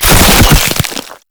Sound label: Proto Infested Maggot Pain; Warframe